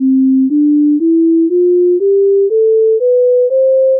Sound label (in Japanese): 正弦波1本による音階, 0.5秒／音; 付録D：音階・音律; 各種音律